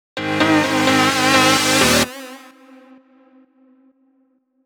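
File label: 128BPM Instrumental Fill, Synth, F minor; Vengeance Essential Clubsounds Vol.5; VEC5 Demo Constr Kits; Mainstage Kit; Root Fmin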